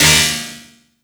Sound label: PERC, Drum & Bass, 170 BPM